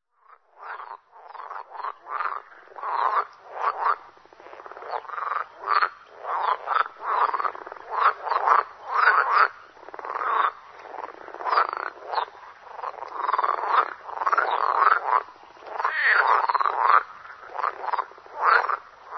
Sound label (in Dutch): groene kikker